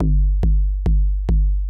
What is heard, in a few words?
KICK TECHNO 140, Techno Drum; Techno2